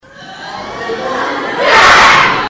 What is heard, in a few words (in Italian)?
bim bum crack